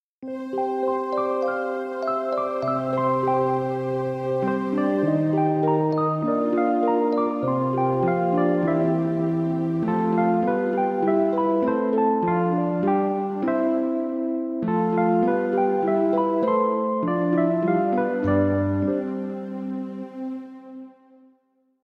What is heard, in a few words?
jingle